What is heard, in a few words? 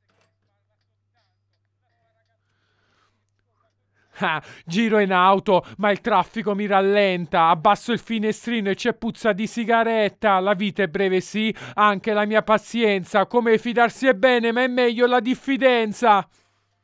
vocal